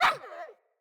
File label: Kubrow Puppy Idle Bark; Vocals